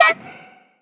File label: Synth